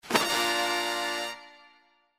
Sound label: Winning; Wealth Gods